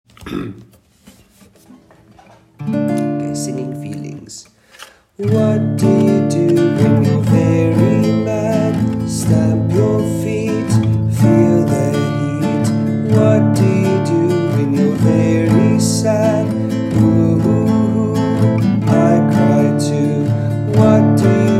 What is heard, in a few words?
good mood swing